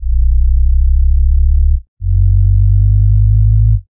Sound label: Simple Sub, synth; Ableton; Factory Packs; The Forge by Hecq